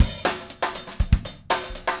Green Maple Groove 120 BPM, Vital Drums, DW Green Maple Kit; Sonic Foundry (Sony Creative Software)